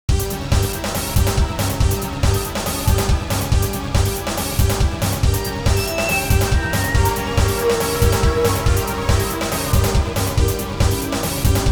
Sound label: RPG battle